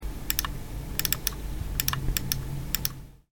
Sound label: mouse clicks